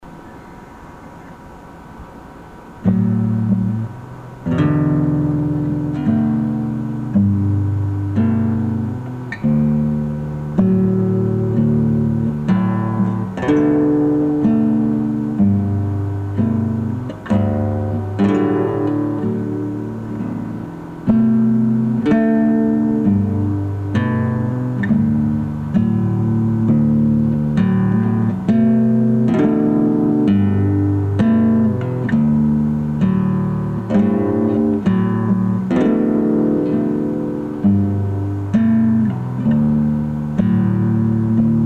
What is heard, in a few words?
Guitar